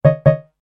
error tone

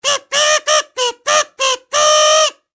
funny sms ringtone

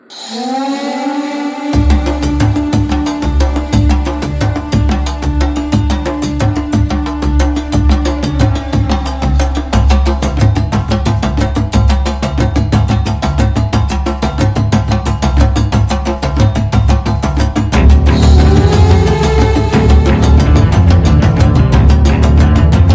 tribal affair ringtone

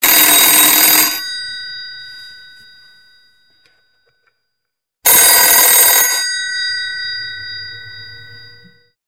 old fashioned telephone ringtone

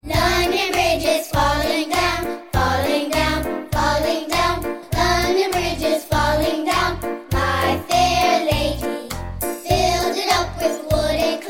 london bridge is falling down

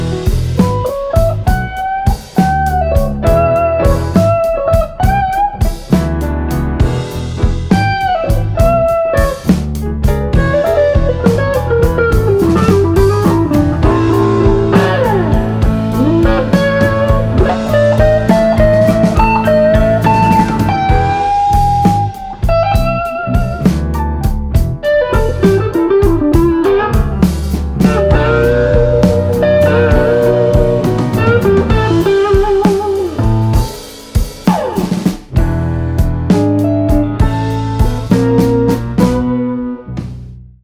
super blues ringtone

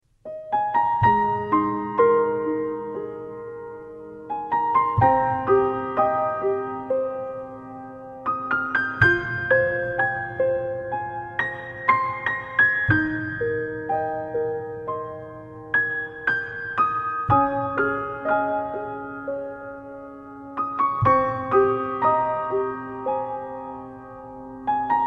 piano

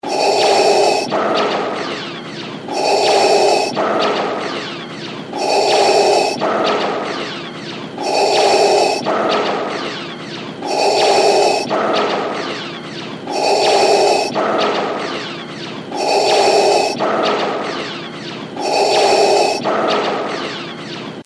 Darth vader breathing ringtone

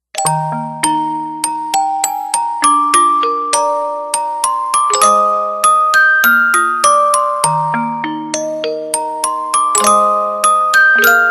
music box ringtone

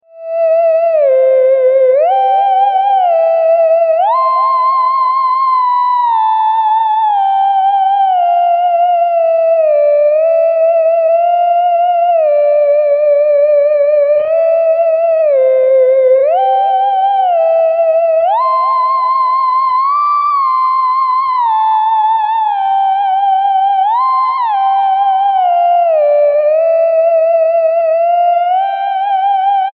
theremin music ringtone

